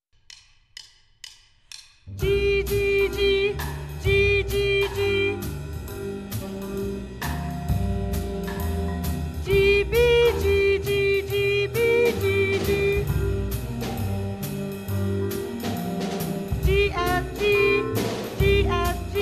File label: Orff Instrument Improv Examples